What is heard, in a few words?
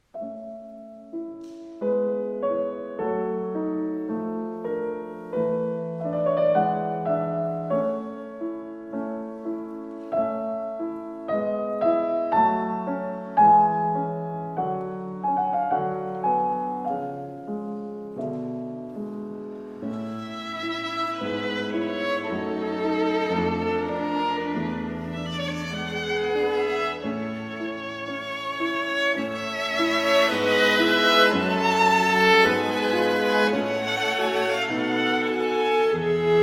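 piano quartet; krogulski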